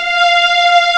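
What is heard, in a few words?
F5 legato F L, string; East West; The Ultimate String Collection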